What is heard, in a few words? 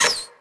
Door Swing Small Closing; Max Payne